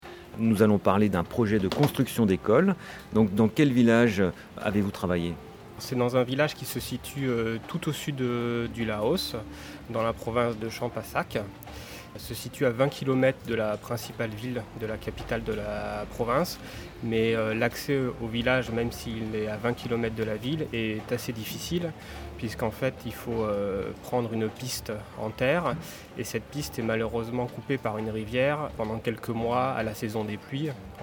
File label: EDM